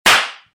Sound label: slap